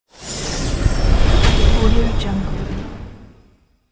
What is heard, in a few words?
magic spell